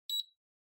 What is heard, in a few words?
Air Purifier (Beep)